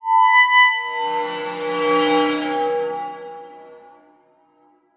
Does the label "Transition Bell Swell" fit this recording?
Yes